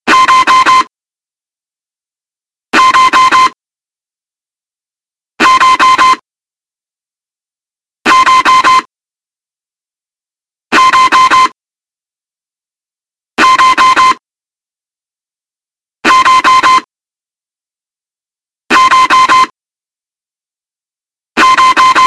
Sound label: b757 767 master caution